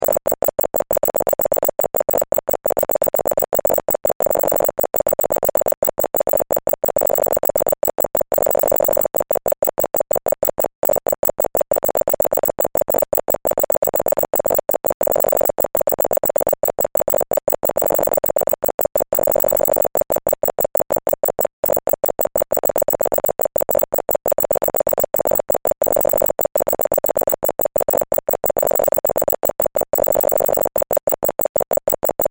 Science Fiction Computer Readout Digital Blips Vintage; Computers; SFX Bible 6